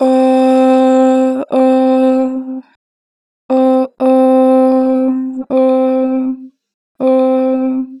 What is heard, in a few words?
Oh, Harmor Vocals; Pitch Flat